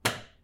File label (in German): Lichtschalter Aus